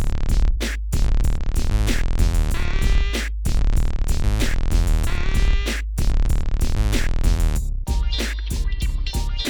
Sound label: 94BPM, Hip Hop; InVision Interactive; 40 Oz Phat Slammin